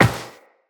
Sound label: Step Metal, Footsteps, Walk; Tenno